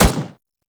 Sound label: Turret First Shot; weapons; Lasrian APC; Warframe